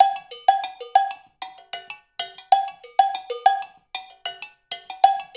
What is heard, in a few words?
LOOP, Percussion, FUNK